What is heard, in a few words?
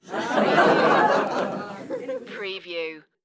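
Crowd Laugh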